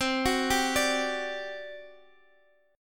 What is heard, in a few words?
G Major 7th Suspended 4th, C